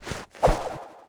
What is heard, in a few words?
frag grenade throw